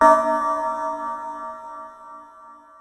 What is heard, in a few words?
acid bell (A); Sonic Foundry (Sony Creative Software); Ugly Remnants VOL-2